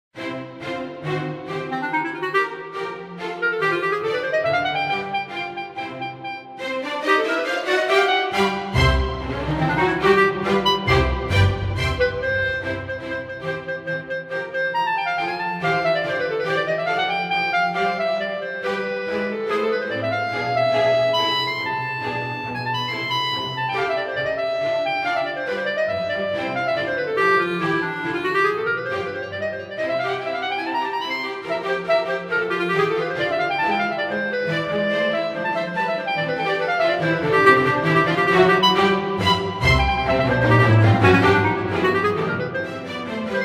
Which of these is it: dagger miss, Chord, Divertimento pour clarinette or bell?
Divertimento pour clarinette